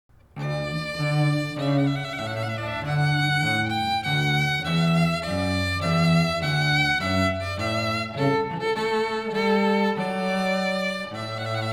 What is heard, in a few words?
Violin Cello; Clarke Trumpet Voluntary